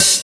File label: clean open hat sound c key